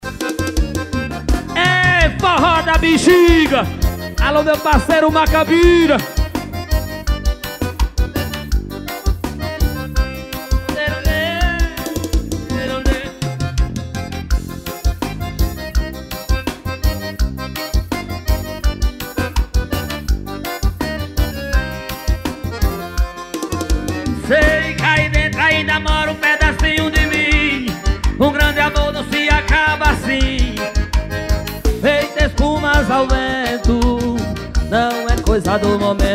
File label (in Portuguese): Forro